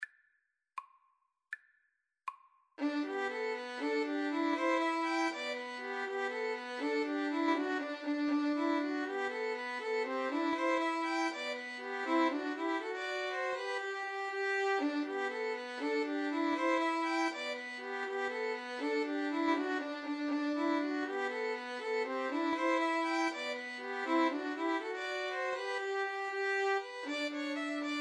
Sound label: 2 Violins Cello; merry christmas